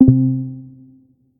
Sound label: section fail; cedru vax amas